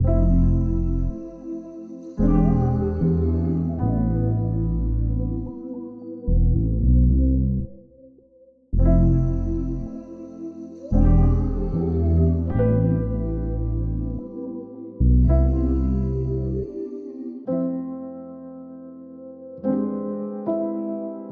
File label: 110 BPM A Min, Melody Loops; No Doubt; Cymatics; Orchid Sample Pack